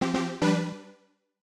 90s Synth, notifications, Retro; Samsung Galaxy Z Fold 7; Sound Theme

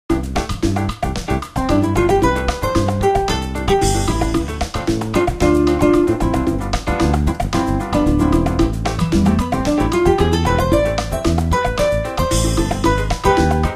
Latin, alarms

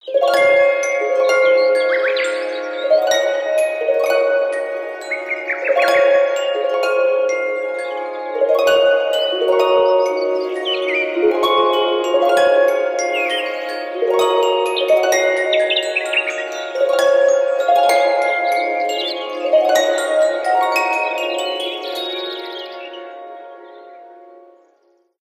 Alarm; Sharp; AQUOS sense10 SH-M33

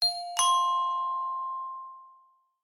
Interval; Tecno Spark Go 2023

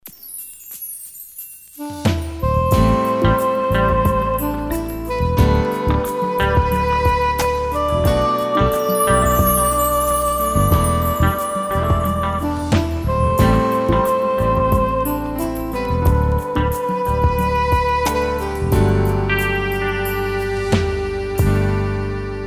Ringtone SEXY; Vertex; Impress Drive

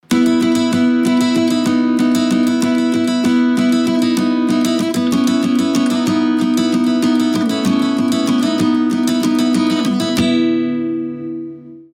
guitar, ringtones; Coolpad; Note 3

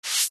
Slide open; Nokia 6267; Waves

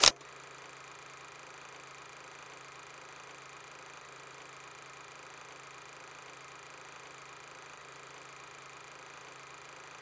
shot more processing; Samsung; Galaxy S8; SamsungCamera7

